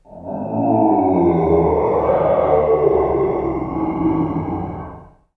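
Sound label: ambient zombie; cs wolfenstein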